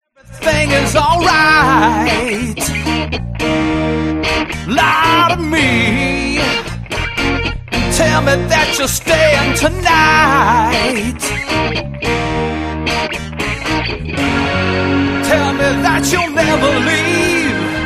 minus drums; play along drums rock pop and blues

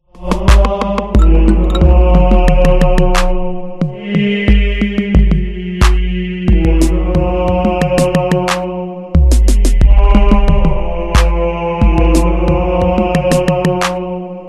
instrumental

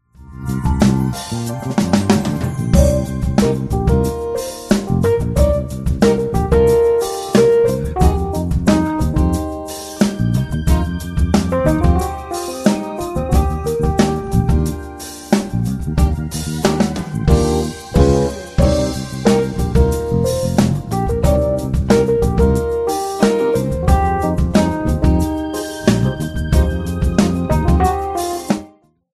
groove

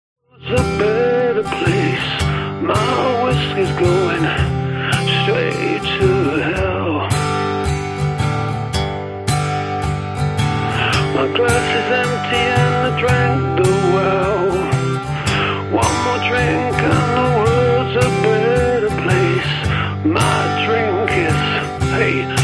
dangerous guitars drinking song

